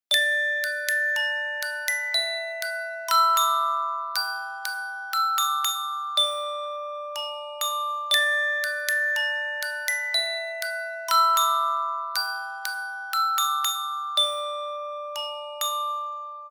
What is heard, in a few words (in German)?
Glocken; ringtone